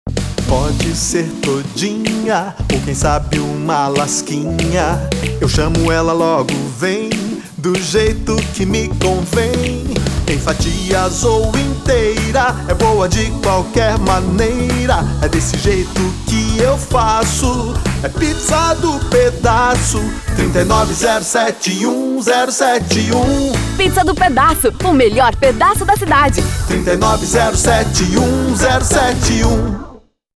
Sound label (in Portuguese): Jingle; Pizza do Pedaço